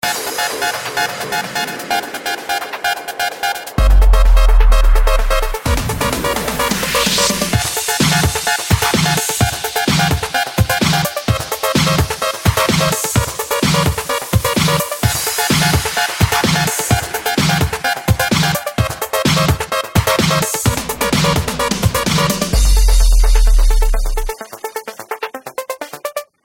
Nokia Hummingbird

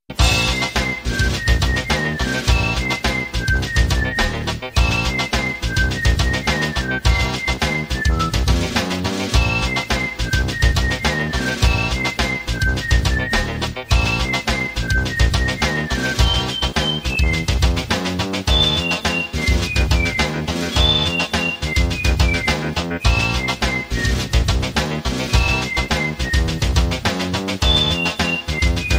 Funky; Donkey Kong

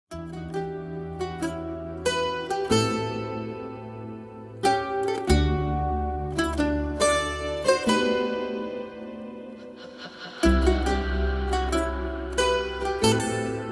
Romantic Theme

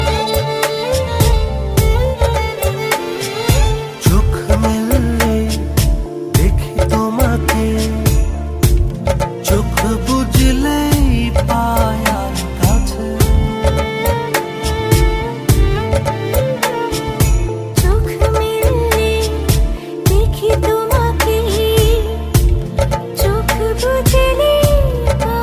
Bengali Song

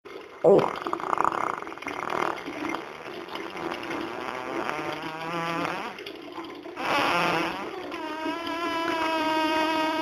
Buzzsaw in the Rain

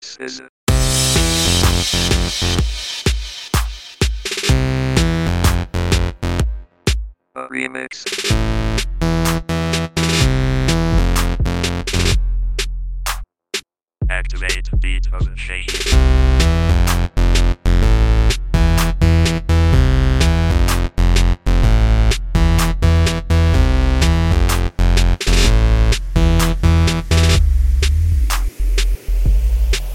Total Drums

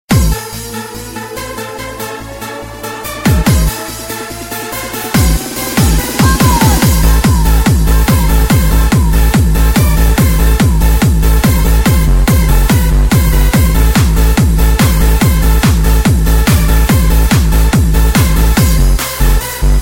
Trance Vs Beats

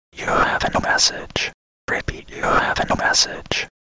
Sms New Message

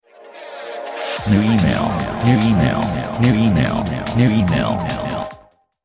New Email

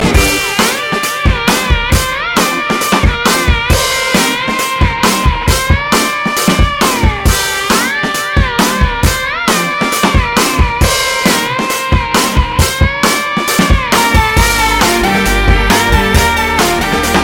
Ring Tone

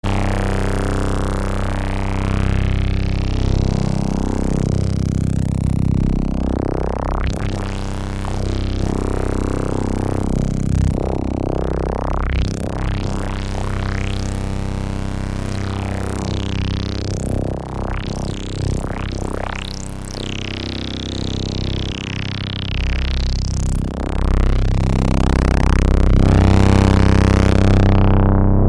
Strange Sounds

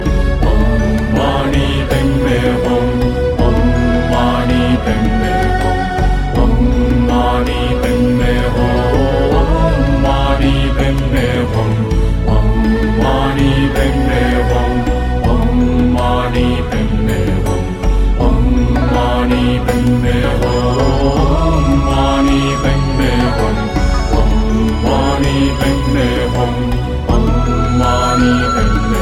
Incantation

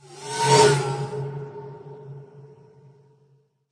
new sms

